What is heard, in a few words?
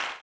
CLAP, Blues Soul; Spectrasonics Vocal Planet